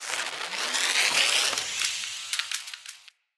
Punch Wind Up; Weapons; Unarmed

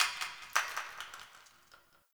Brick Crumble; Ambience; Warframe; Nineteen Ninety Nine

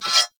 Melee Impact Metal

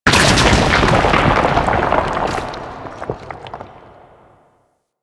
Moon Rock Fall Medium; Warframe; Ambience; Orokin Moon

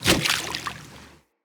Kubrow Step Water, Footsteps

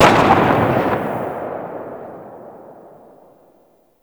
Tail, Weapons; Lato Tail 2D; Warframe

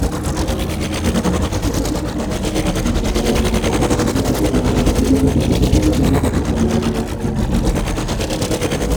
Idle Loop; Liset; Warframe; Awakening Remaster

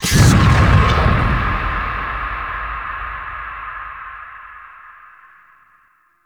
Portal Enter; Gameplay; Warframe; Chimera Prologue

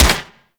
Infested Swarm Rifle 2D Shot; Shock Swarm Rifle; Weapons

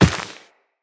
Walk Dirt, Footsteps; Operator

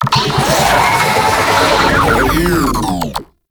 Idle, Vocals; Warframe; Proto Infested; Babau

